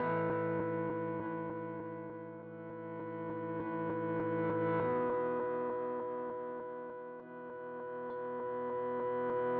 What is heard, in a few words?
organ